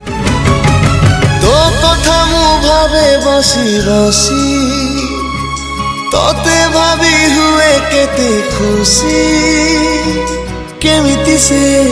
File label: New Odia Song